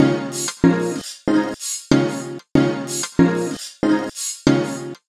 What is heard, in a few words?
Beat, 94 bpm